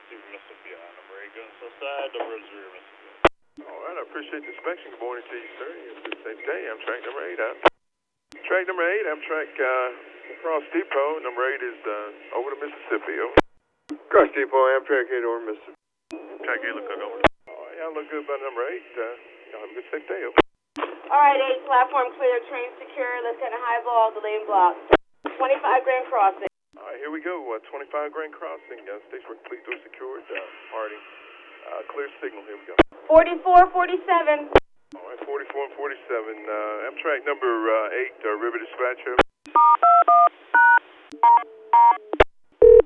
Amtrak

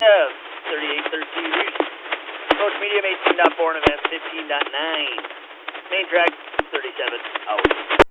BNSF Distant

